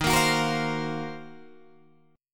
D sharp Minor 6th Add 9th D sharp Guitar Open D, chord